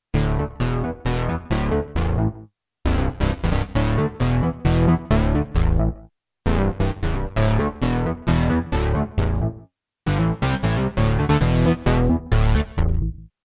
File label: synth step; Creamware minimax